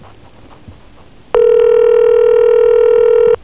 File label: tone dial